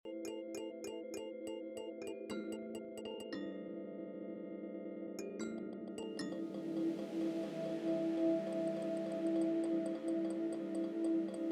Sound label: transmission, dark sci-fi audio; dark sci-fi audio pack